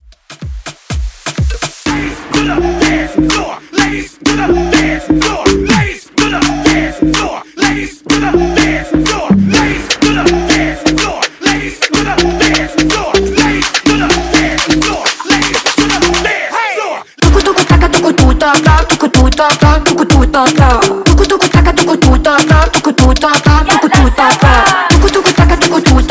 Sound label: Afro Dance Hype Starter Uptempo 125 BPM